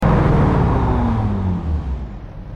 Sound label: throttle off; TDMCarpack; TDMCars